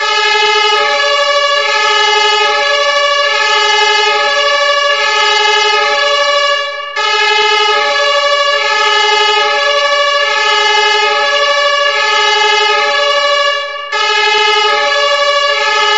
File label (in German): Folgetonhorn; Alarmton; Alarmtöne